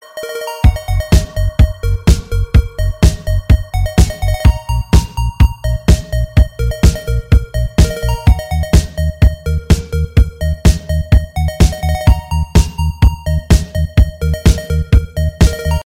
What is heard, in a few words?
nokia lumia jingle ringtone